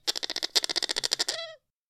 exotic bird sounds; soundscrate

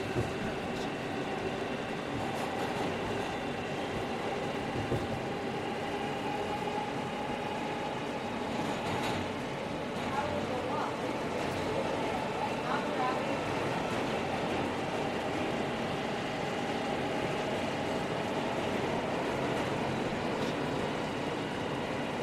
public subway interior; soundscrate

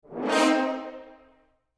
rip chord

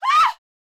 woman fear scream; soundscrate

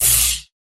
lightsword turning on; soundscrate

